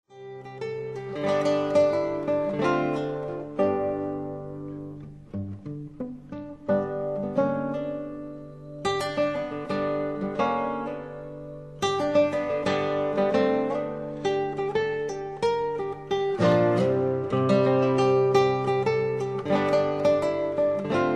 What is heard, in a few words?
Studies for guitar Estudo; No. 9